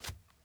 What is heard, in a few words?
dirt jump; teardown ps5 windows xbox series xs gamerip 2022